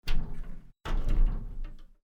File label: door